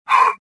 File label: gasp; Star Wars Jedi Knight II Jedi Outcast; XBOX; shadow